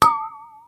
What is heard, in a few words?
Alert